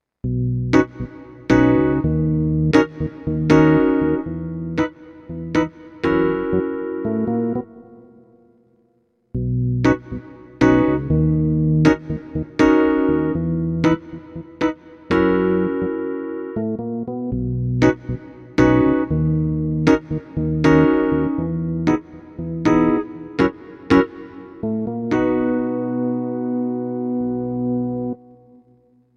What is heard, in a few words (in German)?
Midiplus Stage 88 E Piano und Orgel